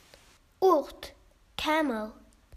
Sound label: Camel